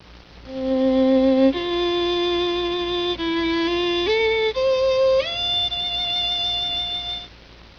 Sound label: Violin